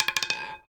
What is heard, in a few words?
ball hard stop, roulette; frenchroulette3